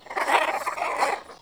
Bird Of Prey Vocal Shake

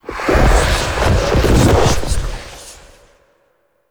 Slam Cast; Enemy; Characters; Abilities; Mendicant; Mnd King

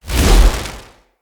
Casting Device Cast Heavy; Weapons; Player

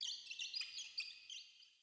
Under City Alley Critter; Ambience

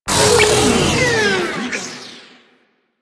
Proto Infested Mutalist Death, Vocals; Warframe

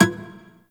Shawzin Wrong Note; Warframe

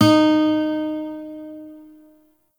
Shawzin Oct Two D Sharp; Warframe; Lotus Shawzin

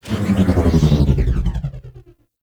Ship Stop; RailJack Ship

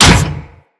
Jackal Step Metal, Machines; Steps

